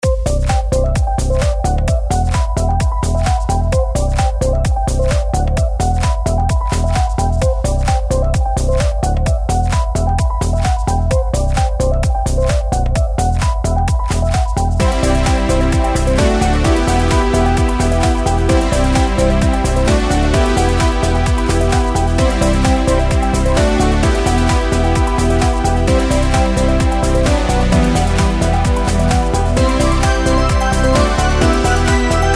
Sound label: reelspin fg; Shopping Fiend